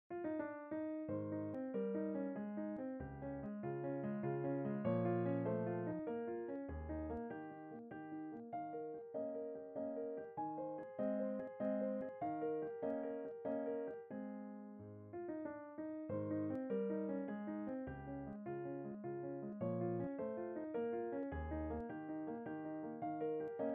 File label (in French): Valse Pour Piano; Ludwig van Beethoven